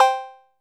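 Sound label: 808 cowbell; Music Store Sound Service; Einstein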